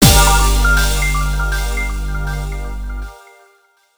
Retry Jingle; Revived; Friday Night Funkin' Dave's Halloween Bash OST mod Windows gamerip 2022

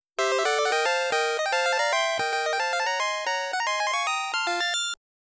Goal

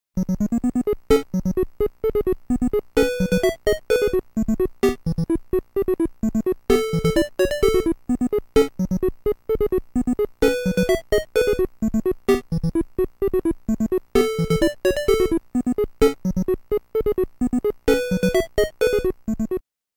Item Acquired BGM

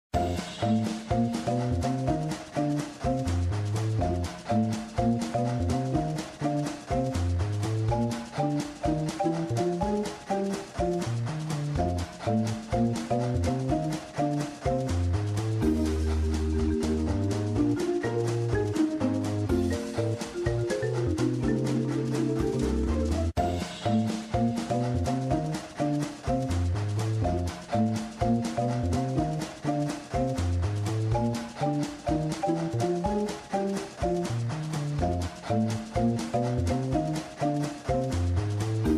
Minigames Slow Theme; Choo Choo Zoo

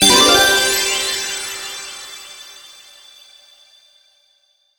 Medal; Pac-Man Championship Edition 2 Plus

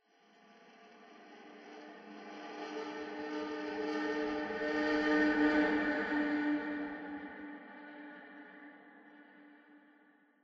Combat Crack

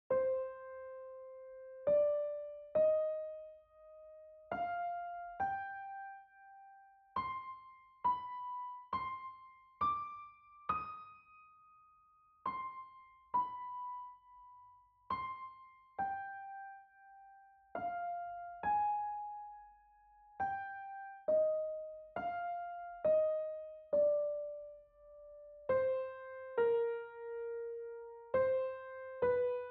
Serenity Interlude (Piano); The Binding of Isaac; piano collection

